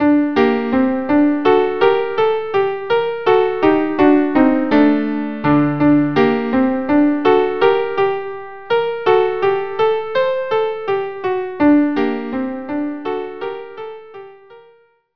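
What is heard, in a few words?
violin viola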